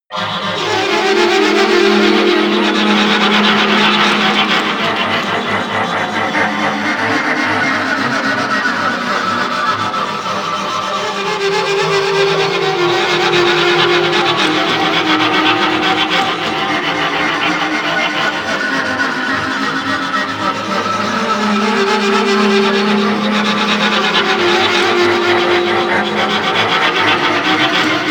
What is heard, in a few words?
atmosphere alien scrape